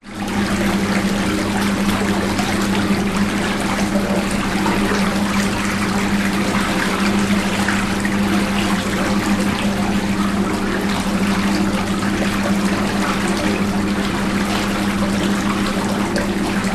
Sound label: household bath spa bubbles motor whirr room ambience run; zapsplat